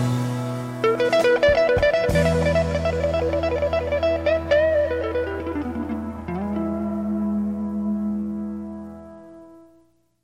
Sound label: guitar